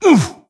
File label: Horseman Wound; svencoop; keenhalloween; horsemen